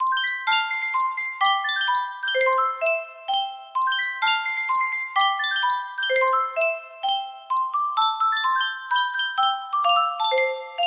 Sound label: music box